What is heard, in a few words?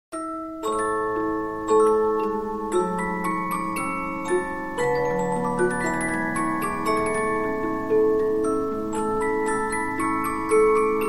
Polyphon, 15inch disc musicbox